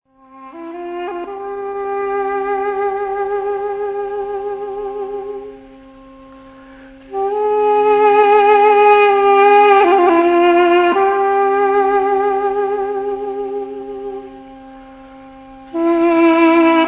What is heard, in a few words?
ringtone